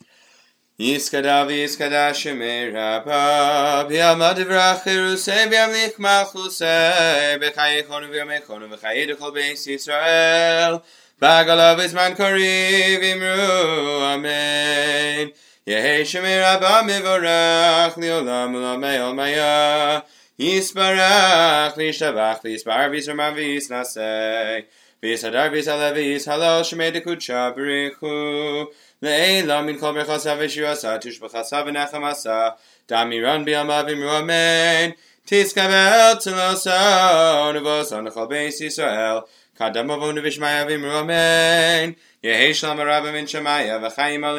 Concluding Kaddish; Musaf

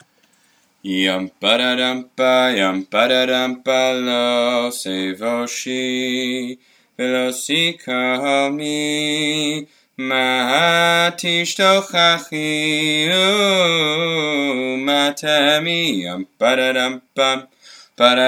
Lo Teivoshi Classic Melody

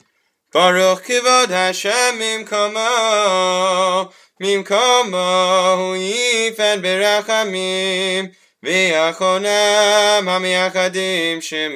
Kedusha Baruch Kvod Standard Nusach; Musaf

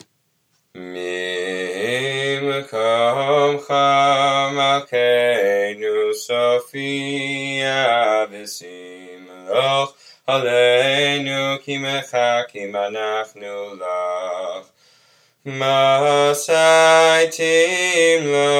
shir hamaalos melody; Shacharit; Mimkomcha

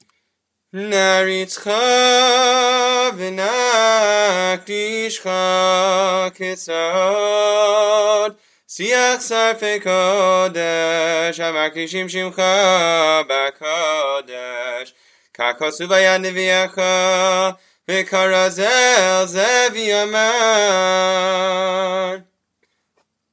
Kedusha Standard Nusach; Musaf; Naaritzcha

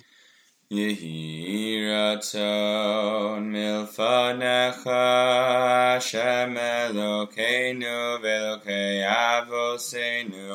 slower melody; Musaf; Birkat Hachodesh; Yom Shabbaton

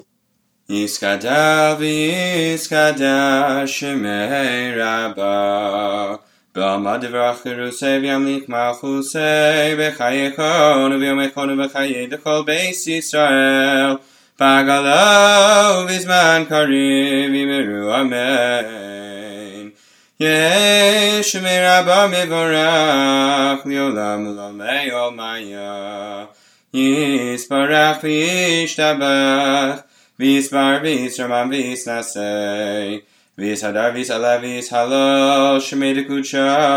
Kaddish

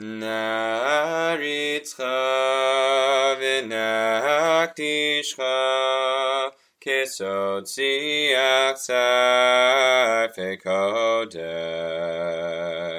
Naaritzcha slow Melody; Musaf

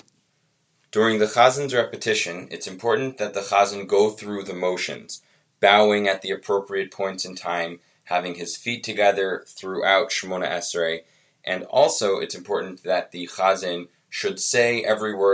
Chazzans Repition; Shacharit

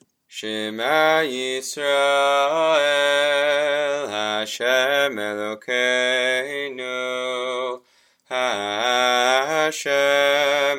Shema Yisrael Yimloch Classic Melody; Musaf

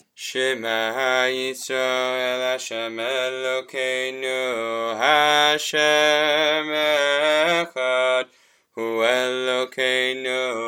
Upbeat Melody; Musaf; Shema Yisrael; Siman Tov

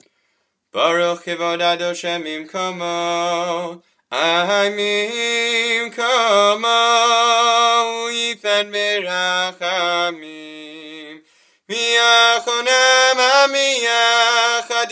Slow Melody; Musaf; Baruch Kvod

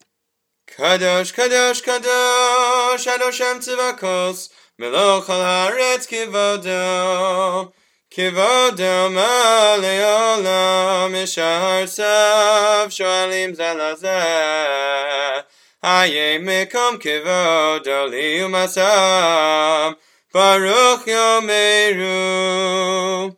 Kedusha Kadosh Standard Nusach; Musaf

